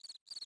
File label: birds; World of Tanks Blitz; hangar blitz